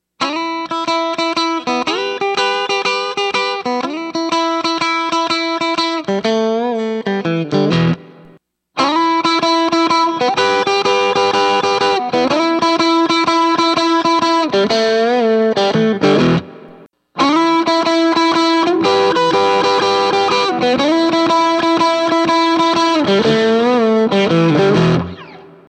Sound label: TS808 Clone Strat